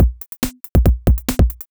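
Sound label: DRUMLOOP ELECTRO 140; Techno2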